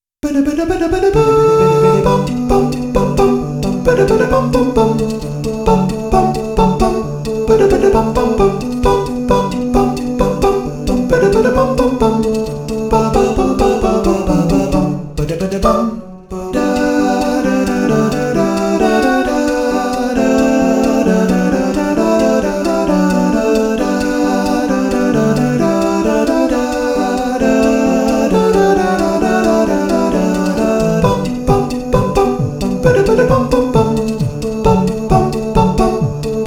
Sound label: acapella; pokemon r b y